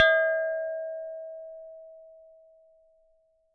Struck Vase

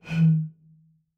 Bottle Blown

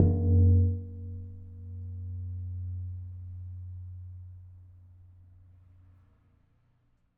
Pane Of Glass Knock

